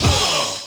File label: "huh"